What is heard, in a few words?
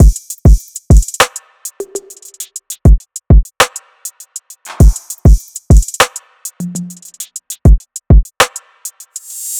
Drum Loop, 100 BPM, RnB; Atrophy; Cymatics; GHOST (Beta Pack)